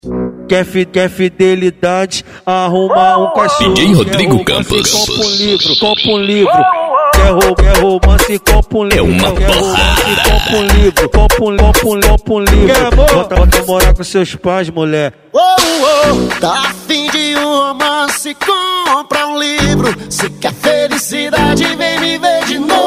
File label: Sertanejo Remix